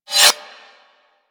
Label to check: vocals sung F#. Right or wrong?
Wrong